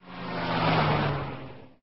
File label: snd Car Drive